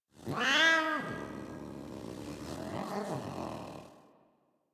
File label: Feral Vocal Idle; Warframe; Catbrow